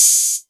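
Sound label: Hat Open, Novation Drumstation; DrumstationV1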